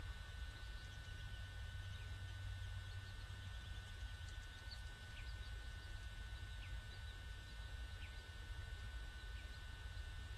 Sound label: woodpeckers